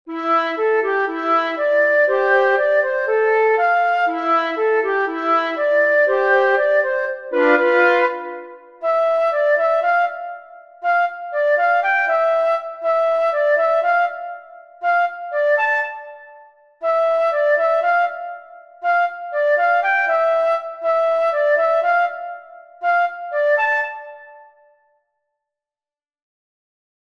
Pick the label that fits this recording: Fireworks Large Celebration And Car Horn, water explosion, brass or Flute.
Flute